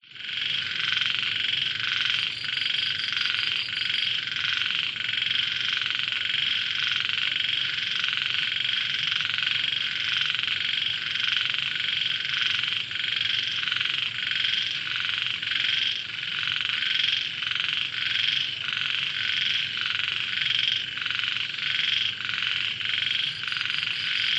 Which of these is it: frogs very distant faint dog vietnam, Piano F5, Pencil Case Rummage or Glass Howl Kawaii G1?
frogs very distant faint dog vietnam